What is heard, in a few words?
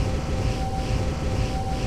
Huge Fan; zps; Ambient; Fans